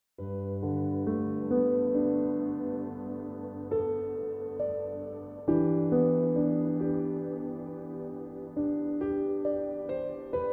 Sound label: Ringtone Piano Music